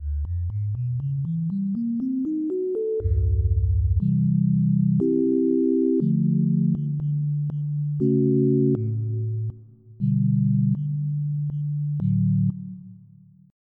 Sine; FF7

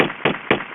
MACH GUN; Piposh

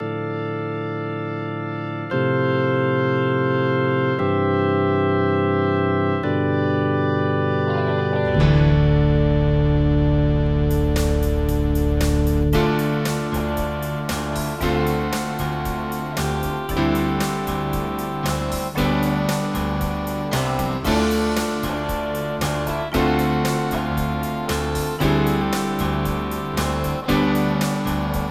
wheel; Composers' Showcase

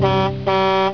Horn; 250 Desktop Themes

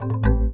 device failed

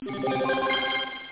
win; Dosgames 2.0; ludo

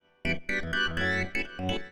synth house 125 A; eJay; Keys

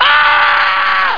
AHH

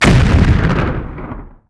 Large Air Explosion; Defiance

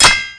block armor; anito; battle

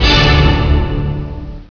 Orch Hit in 8va D3; Star Trek JR Audio

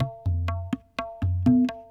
PERC LOOP ETHNO 125

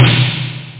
Snare Drum, Drums Percussion

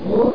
ooh; Sexy Games 3

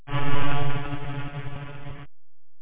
Teleport; grimlore

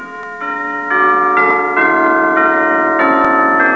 BELLS; ShareWare OnLine Volume 2 (CMS Software)(1993); music

